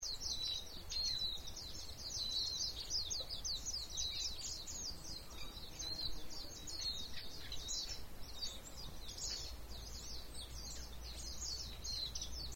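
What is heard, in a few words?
mejiro call, Japanese white-eye; jinaki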